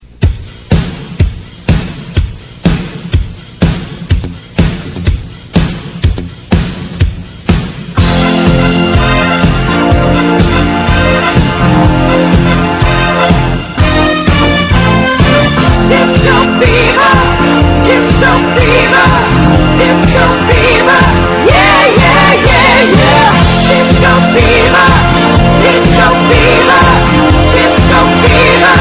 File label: disco